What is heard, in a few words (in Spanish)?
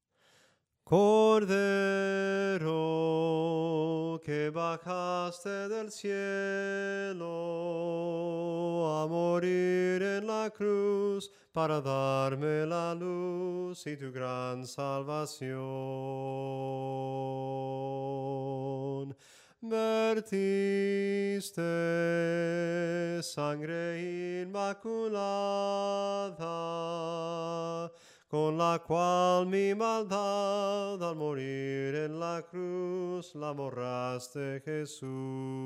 SOPRANO CANTADO; CORDERO